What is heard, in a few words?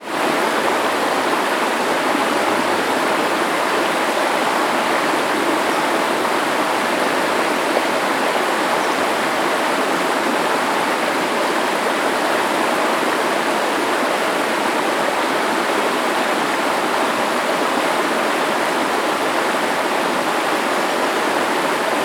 nature waterfall creek forest birds